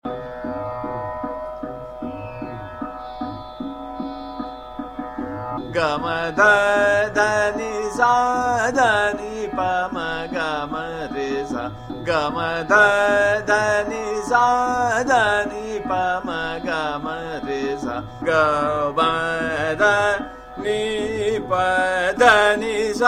Sargam - Ga Ma Dha Dha Ni Sa - Trital, Shahana Kanada